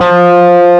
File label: F-2 4 OVER D, GUITAR; AKAI S-Series CD-ROM Sound Library VOL-8